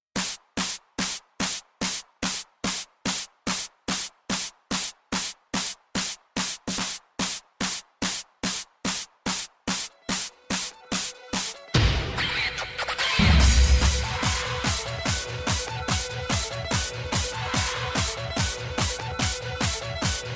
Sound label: hardstyle thunder mix